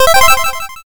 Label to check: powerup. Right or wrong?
Right